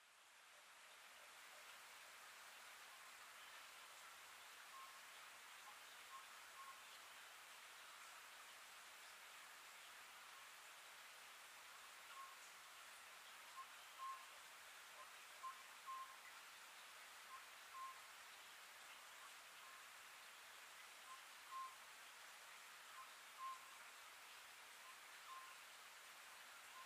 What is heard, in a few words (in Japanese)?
konohazuku